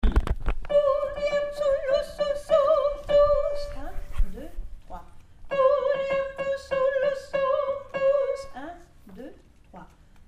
quoniam sop; gloria de vivaldi

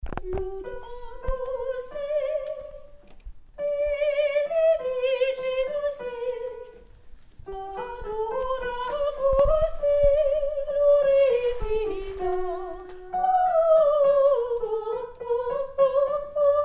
laudate sop; Laudamus te - Extrait du Gloria de Vivaldi

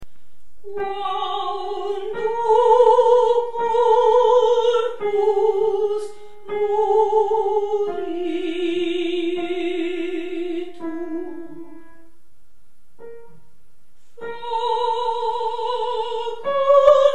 stabat alti quando corpus; Stabat Mater - Giovanni Battista Pergolesi; 12 Stabat quando corpus alti

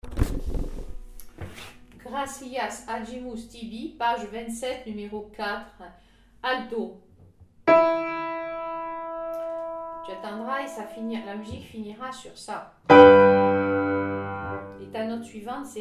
gratias alto; gloria de vivaldi

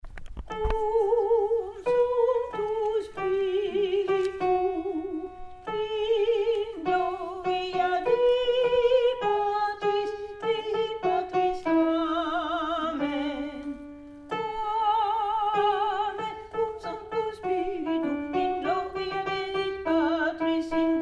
cum sancto alto; gloria de vivaldi; Vivadi cum sancto alti1

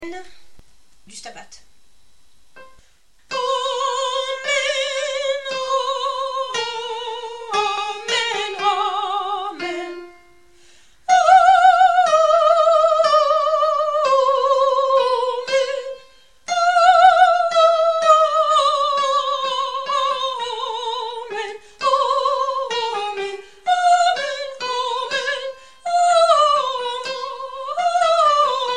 stabat soprani amen; Stabat Mater - Giovanni Battista Pergolesi; 13 Stabat amen soprani

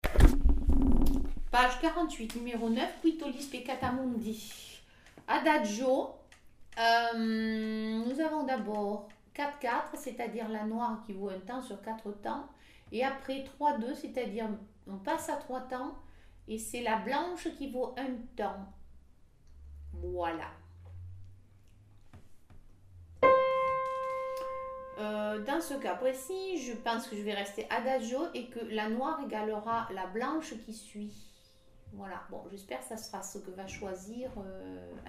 qui tollis alti 2; gloria de vivaldi

